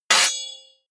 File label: hit metal sword medium